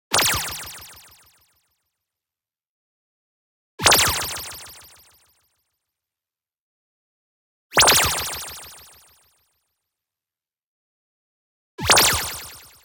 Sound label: zap, accent neutral delay; boom library; Anime Essentials